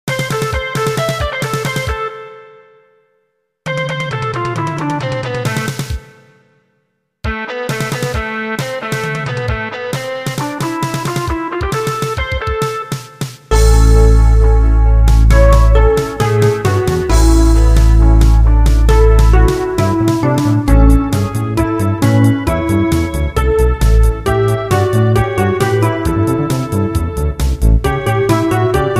drum fill

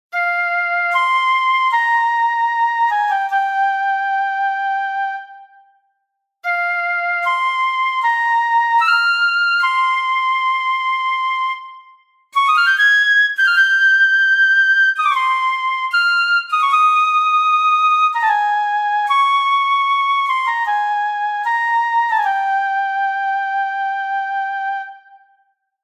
sad, jingle

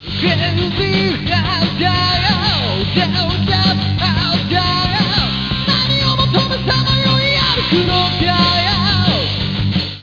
explod

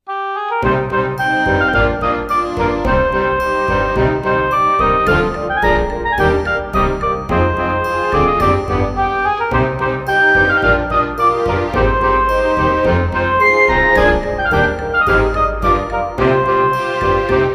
dungeon; ダンジョン音楽B; 森・田舎・素朴; 40秒ループ

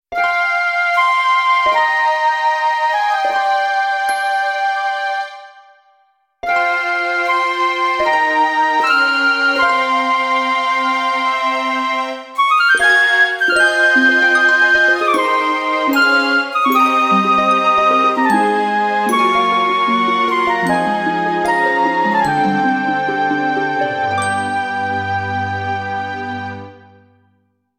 sad, jingle

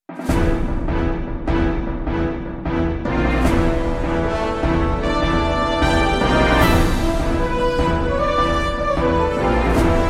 battle

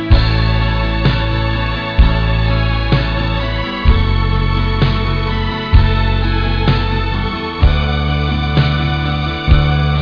beats

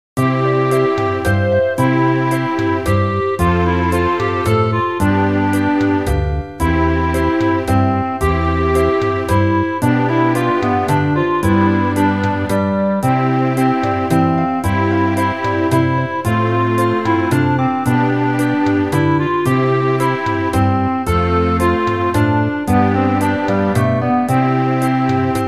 waltz

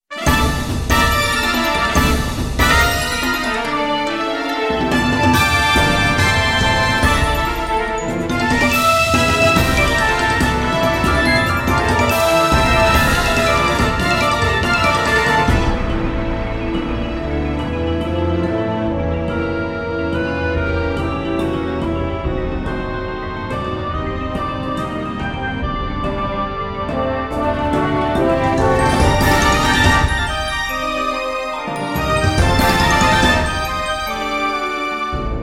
battle

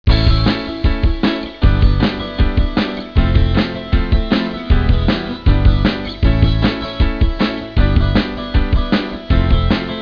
beats

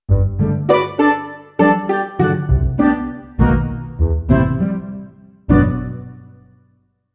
gentle, jingle

